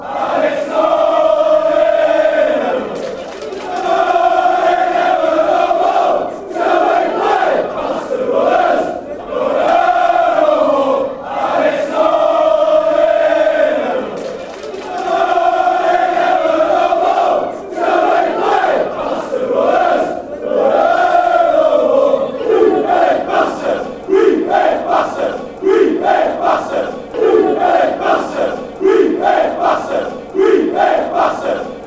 no nay never chorus only

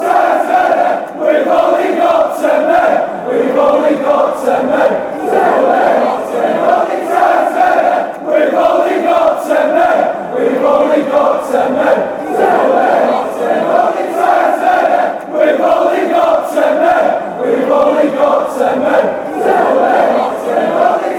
ten men

